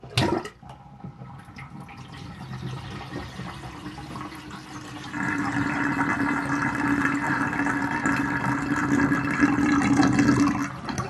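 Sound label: drain outlet long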